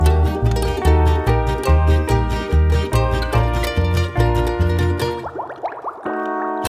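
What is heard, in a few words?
ocean world music suspense beach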